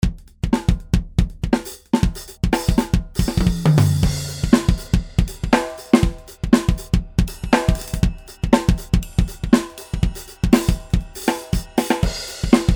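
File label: drums only; drumod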